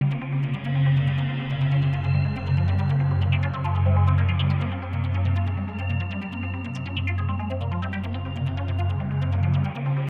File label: muse loop; gunmach